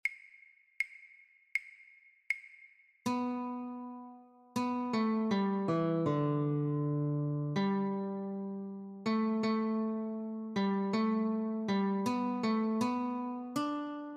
Go Tell it On the Mountain - Notes, guitar; christmas

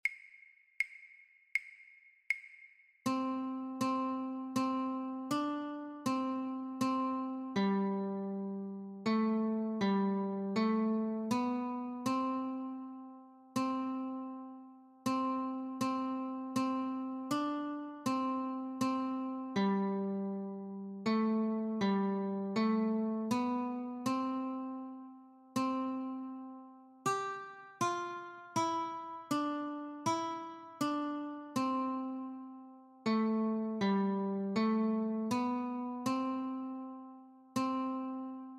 Notes, guitar; christmas

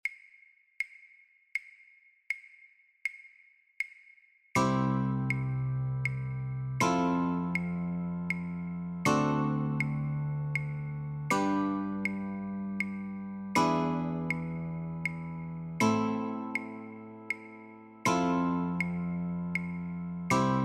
Chords, guitar

